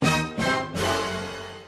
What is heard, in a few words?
Dramatic Sting